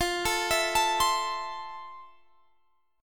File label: F Major 7th F, Guitar, Standard; arpeggiated; JGuitar